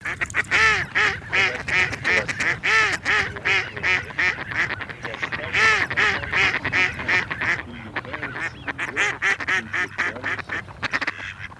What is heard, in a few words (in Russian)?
Кряква Germano; plurifon